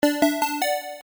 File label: bgm coin in; ユウラボ8bitサウンド工房; コイン投入とかスタートボタンを押したときとか